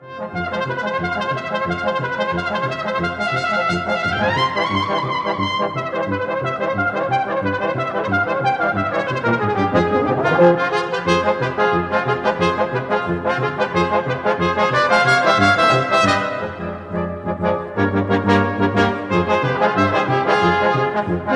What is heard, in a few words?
Gipsy Brass; Brasquintett